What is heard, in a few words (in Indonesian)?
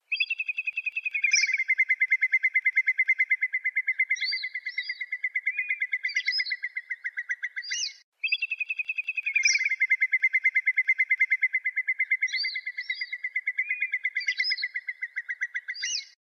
gajahan pengala